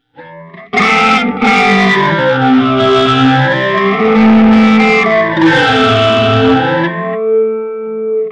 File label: Deep Phaser; Moogerfooger MF 103S